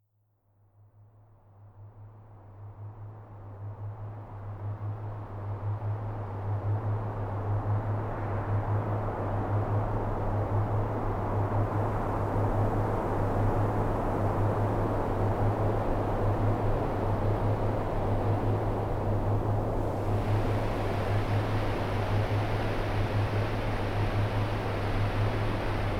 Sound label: Ambience + Theta Waves; relaxation